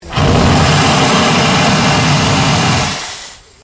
Steamtrain Venting; Bellarine Tourist railway vehicles; Trains

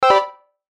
Synth Stab Bright; Multimedia; Blastwave

